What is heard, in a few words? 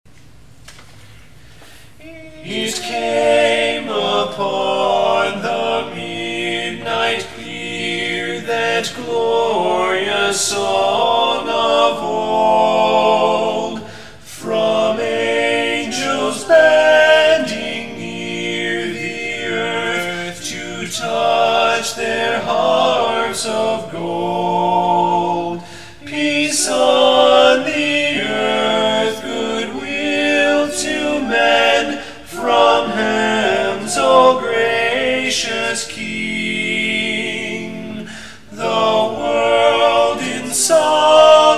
it came upon the midnight clear baritone